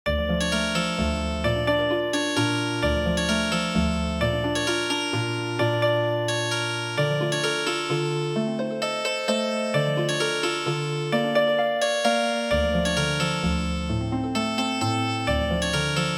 Healing Harp; EiAman

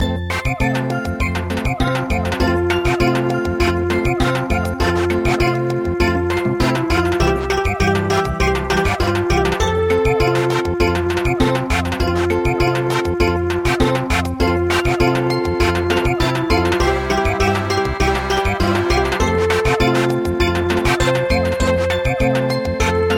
Samba, midi

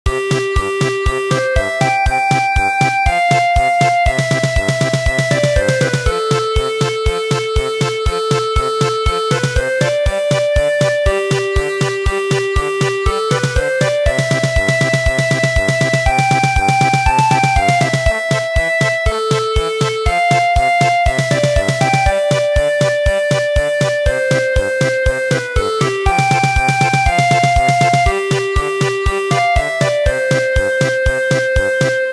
German Polka, traditional; The Whisper Of West Wind

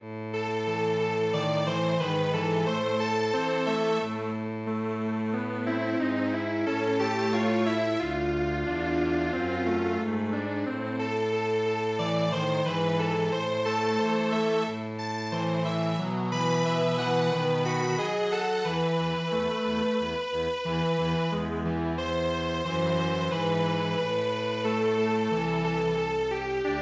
Strings Quartet

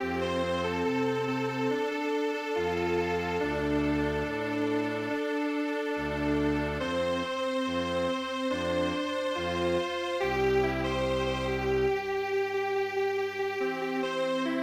strings

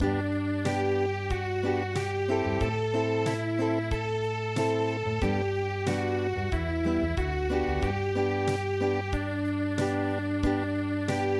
Unplugged

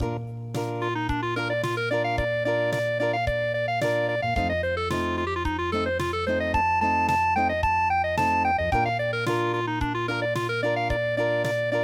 CLARINET POLKA Unplugged

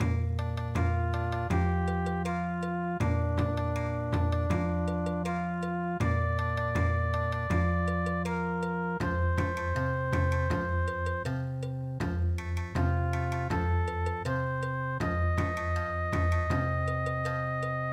Bon Odori, traditional; EVENING CHIMES

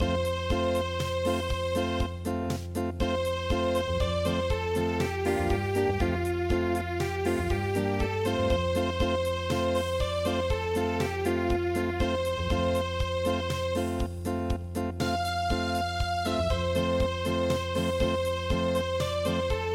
gyeong bok gung ta ryeong Unplugged

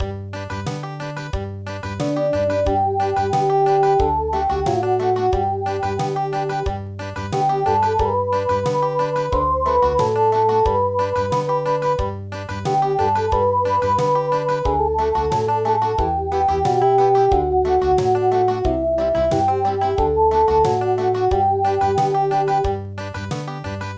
Country

